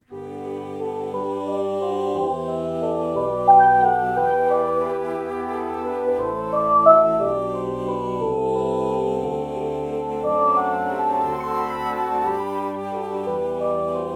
alto flute oh morph

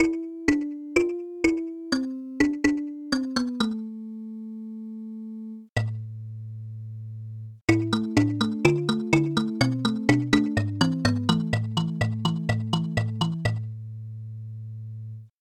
kalimba

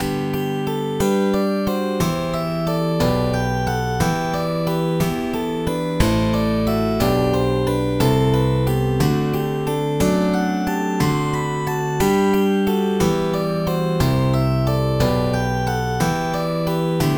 slap bass

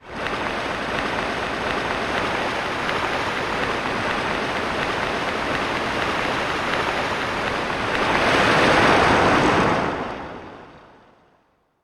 sea shore; bass

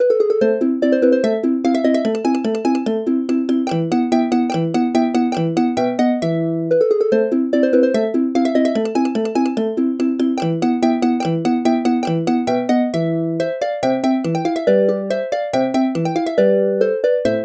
harp

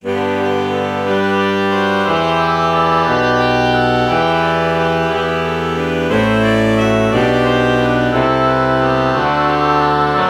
alto saxophone oboe false bach morph

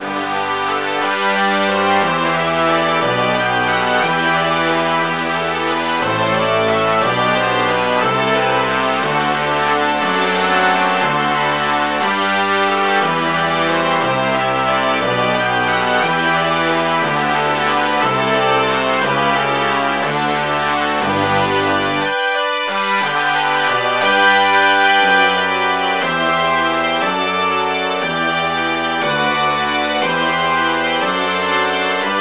brass section

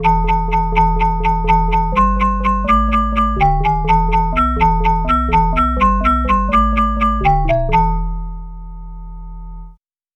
music box bass

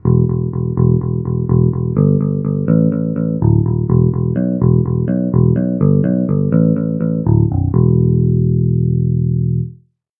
clean guitar bass

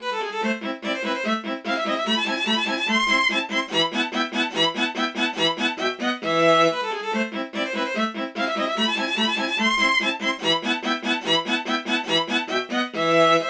viola

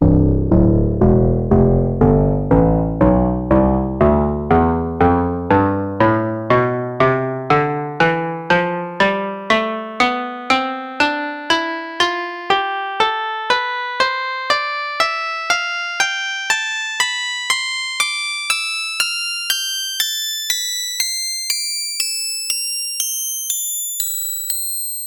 koto tonleiter

